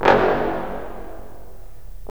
BRS, Roland LA Composer

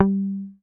MUTE G3, Bass; Sampleheads; Will Lee Bass Library

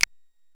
KOMMERZDRUMS

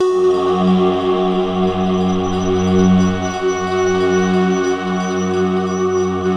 mystery pad; Optical Media International; Sonic Images Library; Top Secret

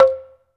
BAMBOO, Pitched Percussn, Bass Bamboo Mrmb; E-MU Producer Series Vol. 7 – Old World Instruments

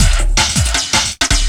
LOOP, 160 BPM, Drum & Bass

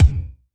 Yamaha CS1x Drums

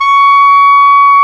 CLAR, Clar Jazz; Clarinets; Roland L-CDX-03 Disk 1

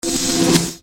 Snare Dr

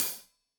Hat Loose, Hi-Hat, Cymbals Stereo

